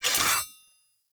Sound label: Two Handed Axe Equip; Weapons; Enemy; Axes; Sinecure Two Handed Axe